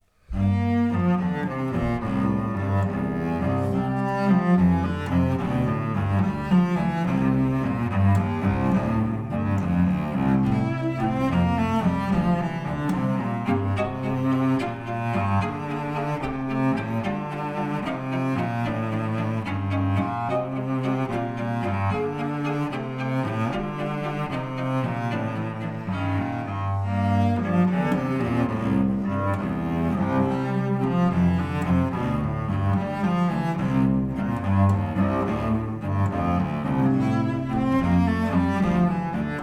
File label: cello duo